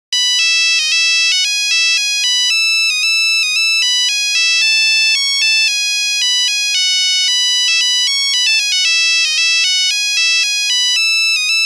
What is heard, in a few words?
Nokia 6210 Brave Scotland, Nokia Buzzer; Windows Phone apps